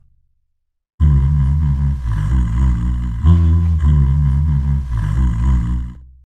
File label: Desecrator Hum